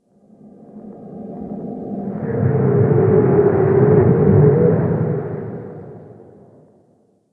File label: Interior Wind Howl; Ambience; Duviri; Warframe